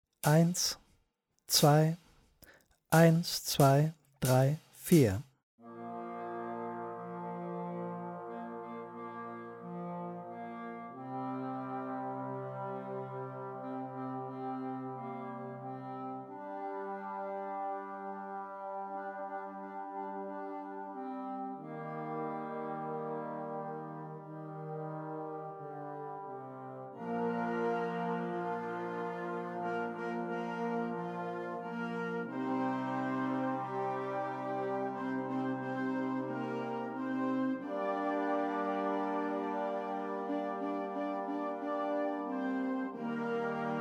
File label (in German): Schlagwerk Glockenspiel Uebetempo; Vaiana